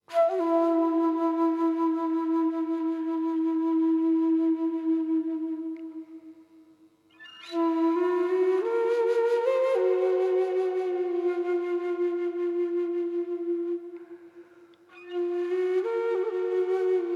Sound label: Flute